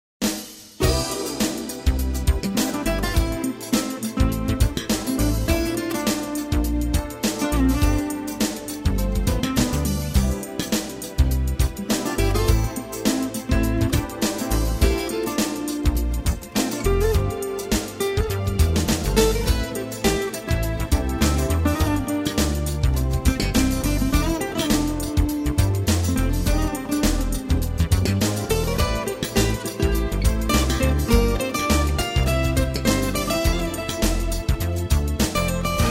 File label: JAZZ LITE, Royalty Free Music; podcast production toolkit